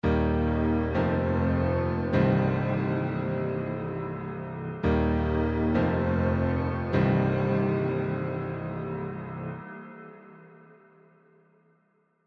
piano; ADAPTIVERB

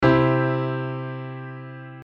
piano Velo

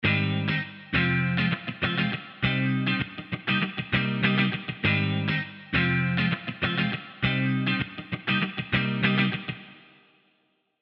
Guitar clean; sunset sound

